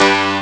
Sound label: accord; Amiga Soundtracker Sample Packs